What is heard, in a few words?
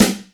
Snares, Real